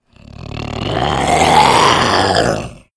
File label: hunter stalk, idle; player; source engine; left 4 dead 2